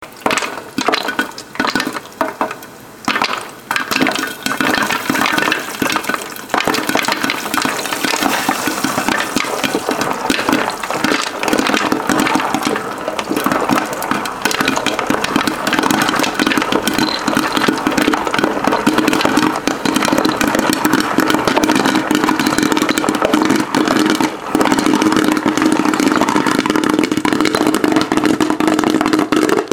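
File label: popcorn popping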